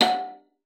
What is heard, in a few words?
F#5, VIOLA FINGER; Xsample VOL-5